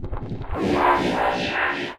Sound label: Synth Sting; musicradar